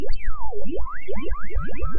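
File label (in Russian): priem komand elektronika, zvuk lunokhoda; prim komand elektronika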